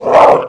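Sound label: orc warrior attack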